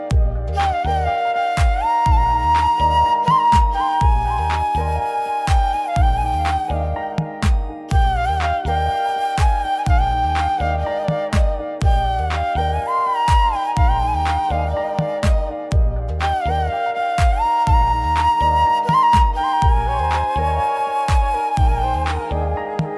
flute instrumental ringtone; tamasha

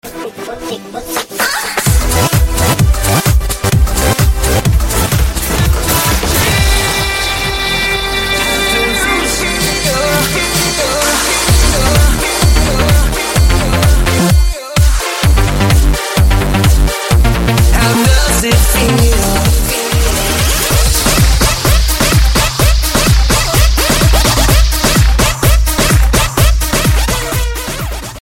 electro house mix ringtone

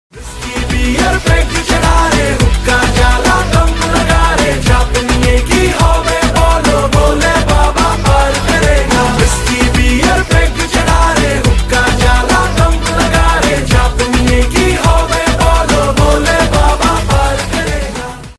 bengali ringtone

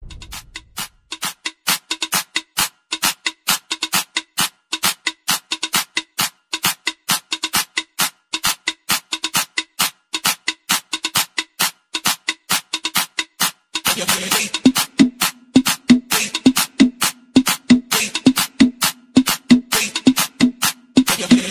arabic club ringtone

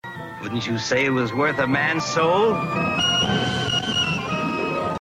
ringtone; y9 huawei

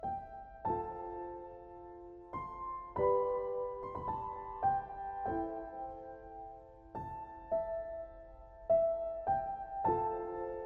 soft piano ringtone

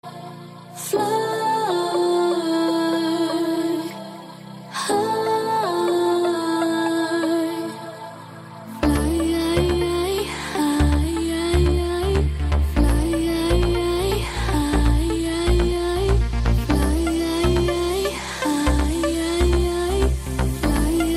samsung s8 ringtone

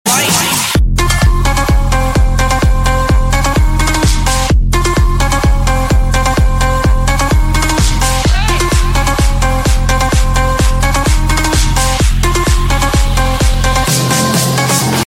iphone ringtone

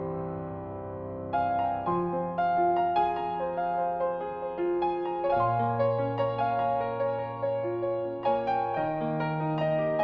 soft ringtone

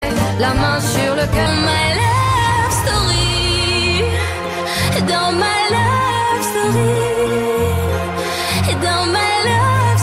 french ringtone

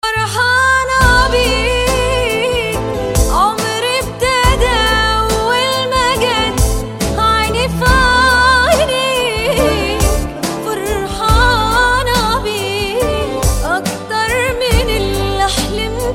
romantic tone ringtone

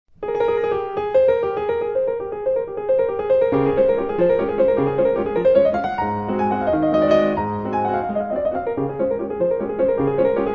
classical minutenwalzer ringtone

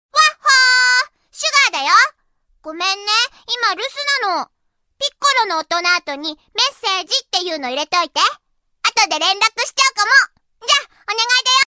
japanese soul ringtones

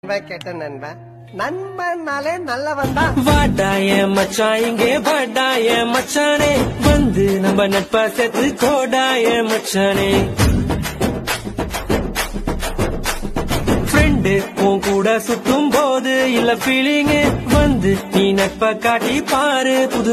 tamil remix ringtone

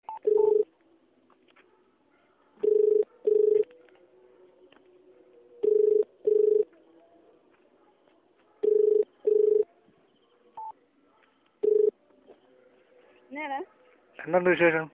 malayalam ringtone

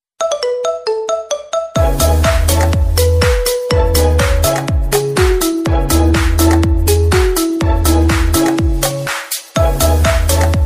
iphone ringtone

